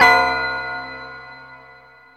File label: BELL; Back In Time Records; Big Bang; THE WORLD